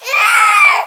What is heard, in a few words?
Sfx Minigame Error Painful